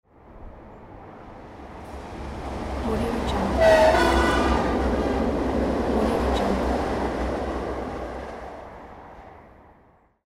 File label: trains passing by; mrsoundfx